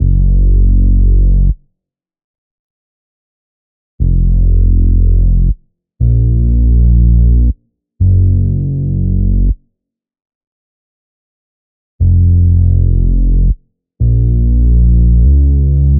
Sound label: 120 synth bass A m; stranded